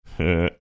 cute laugh

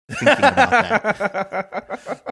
laughing

